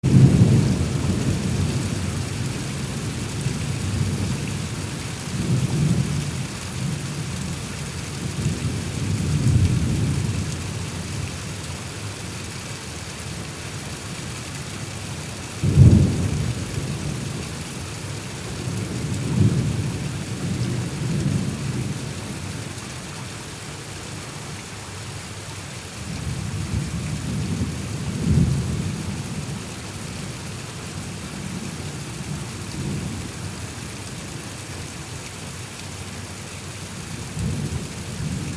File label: Summer Storm; Construction Kit; HIP-HOP; Sonic Foundry (Sony Creative Software)